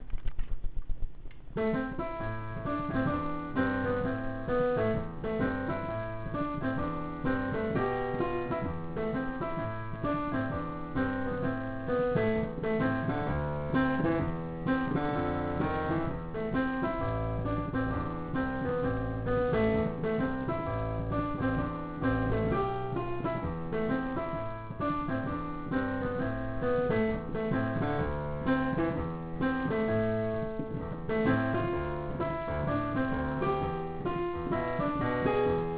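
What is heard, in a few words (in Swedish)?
Schottis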